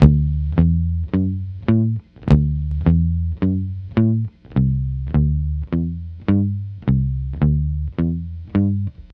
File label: Multi Guitar Swing Bass-D, 105; Zero-G Kocktail Kollection; Construction Kitz (Lounge)